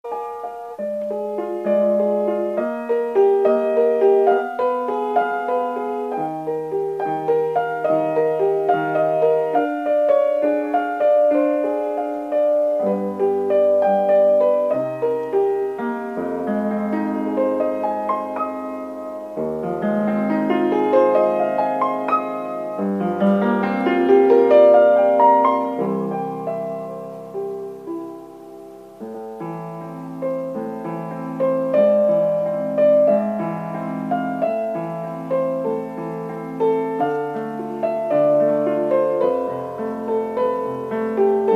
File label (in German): Hoerprobe Mini Piano